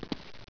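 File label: player step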